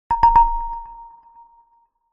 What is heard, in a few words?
multimedia alert bright notify; zapsplat